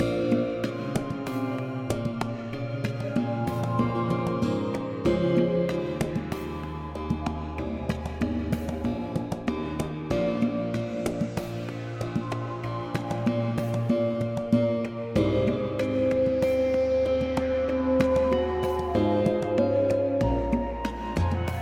town lawless; denmorth

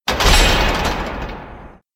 door close

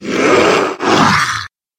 monster dark harbinger

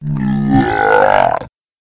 monster molten

